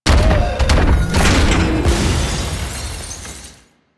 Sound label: Transition; Warframe; Jackal Redux